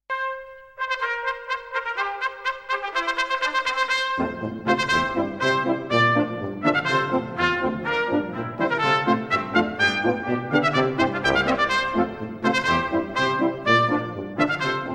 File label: Fanfare